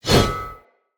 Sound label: Whoosh, Swords